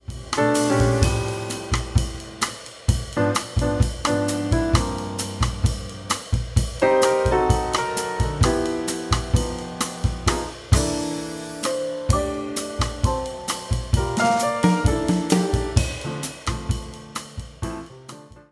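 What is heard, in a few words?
Pno Dr, piano drums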